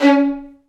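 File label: Vio spiccato C#3; Digital Sound Factory Virtuoso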